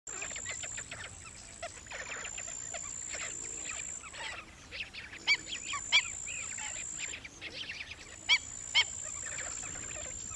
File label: Stilt, bird songs